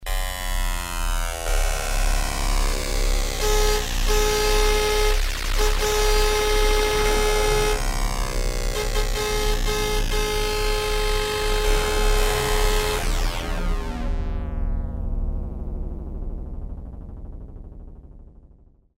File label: EFFECTS, Casio VZ-10M; VZ ROM Card